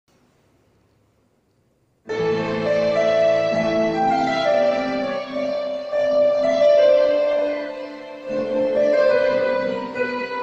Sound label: theme piano cover; kadhalar dhinam